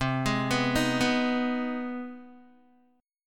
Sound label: C Minor Major 7th Sharp 5th, Guitar; CmM7#5; arpeggiated